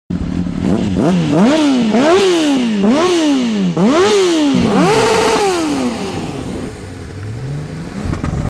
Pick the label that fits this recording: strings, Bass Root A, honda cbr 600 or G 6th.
honda cbr 600